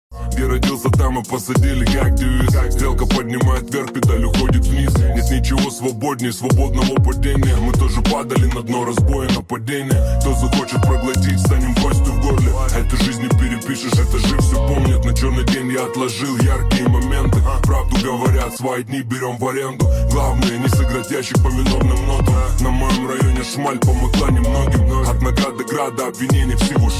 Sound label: Kriminalnyi bit